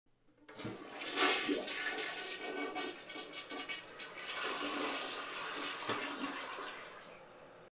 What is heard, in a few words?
toilet; توالت فرنگی